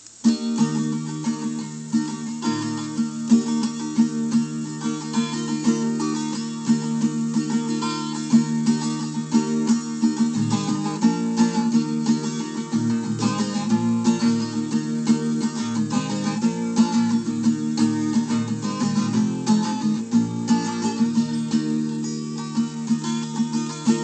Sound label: more guitar